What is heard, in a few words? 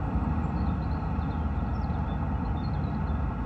Ambience Loop, outdoor